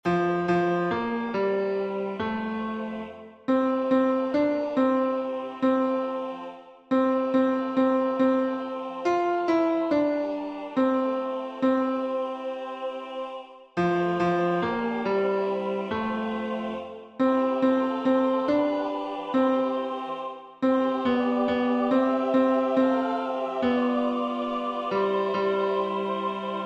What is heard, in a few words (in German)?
Hoeruebung Tenor